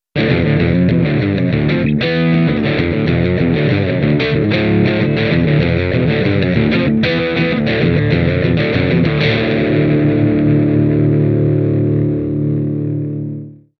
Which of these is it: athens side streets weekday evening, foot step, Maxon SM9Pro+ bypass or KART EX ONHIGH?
Maxon SM9Pro+ bypass